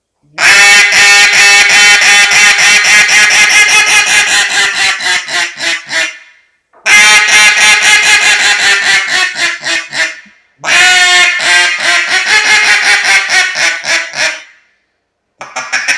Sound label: Arkansas Style Duck, duck sounds